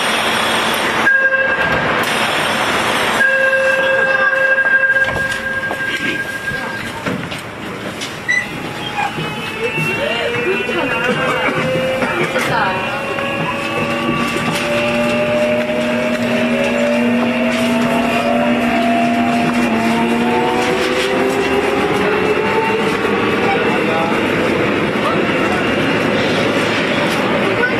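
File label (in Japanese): 走行音, 1号線, ソウル地下鉄; Seoul; 市庁(City Hall)-ソウル(Seoul)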